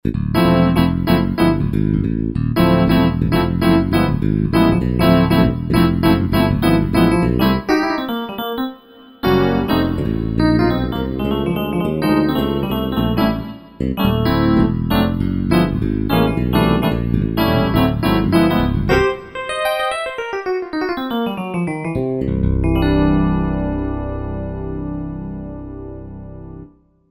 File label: Korg Wavestation A-D